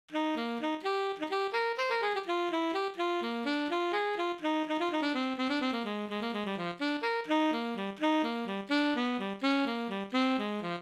Tenor Sax

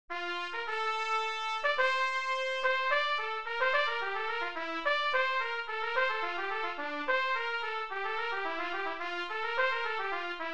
Trumpet

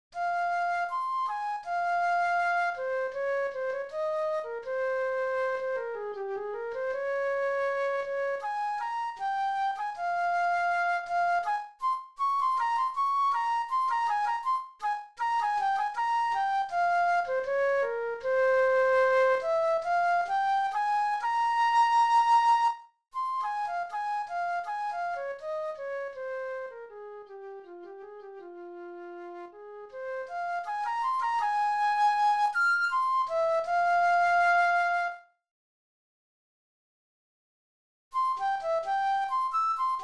jr flute

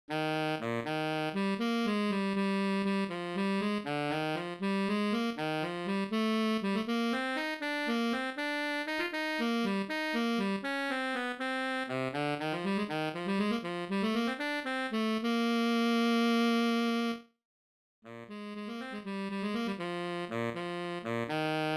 barisax

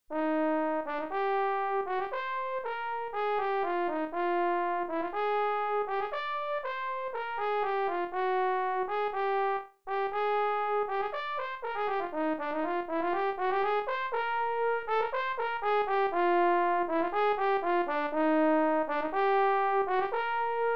baritone

